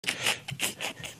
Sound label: snickering